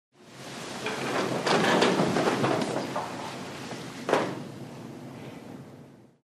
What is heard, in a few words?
People sitting down in court